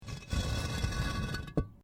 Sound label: Brick Medium Hollow Move